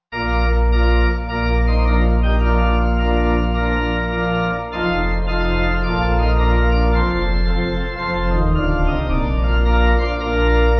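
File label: Pipe Organ; Jesus Died For All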